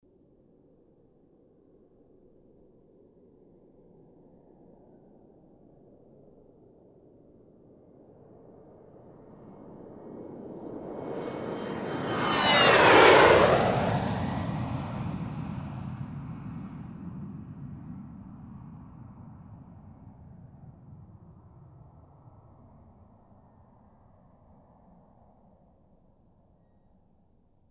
Aircraft Jet Flying by whirring sound

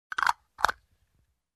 Jar Opening Closing

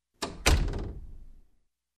Metal Door closing

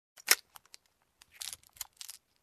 Reloading gun magazine

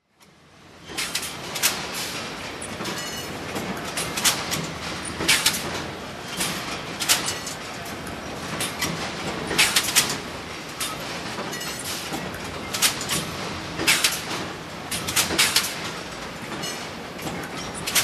Industrial Metal Cutter Machine

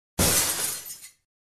Glass Shattering

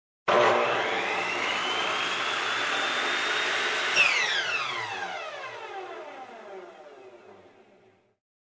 Drilling Steel

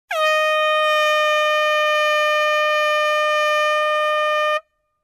Air Horn

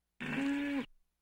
Mad bull moo

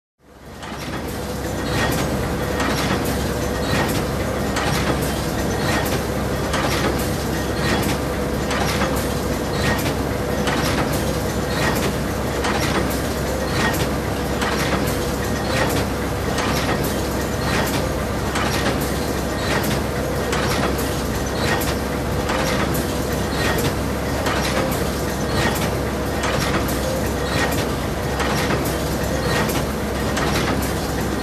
Industrial Heavy Machine running

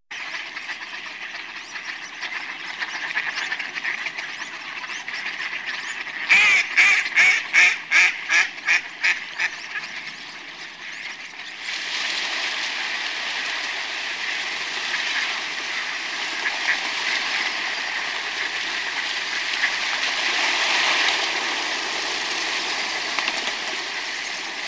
Flock of Fowls

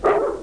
Single dog bark hallway

